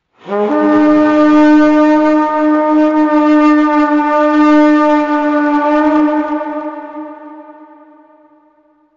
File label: Viking War Horn